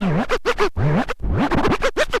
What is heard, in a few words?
scratch kit, vinyl